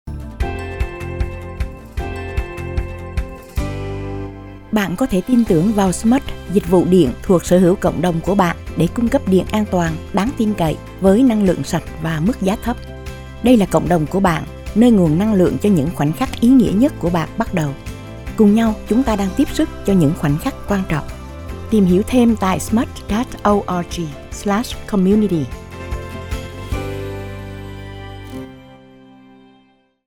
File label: with music; SMUD